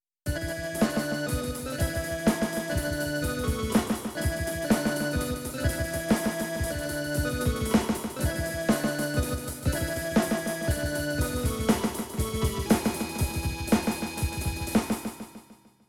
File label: Chorus